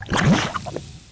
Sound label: lava bloop; Tribes2